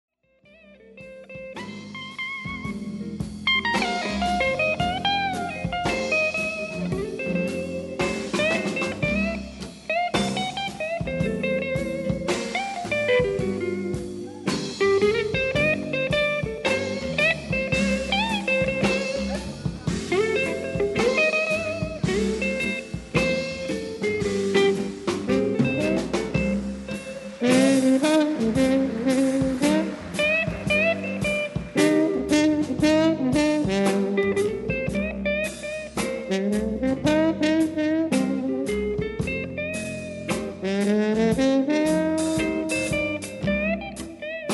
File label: blues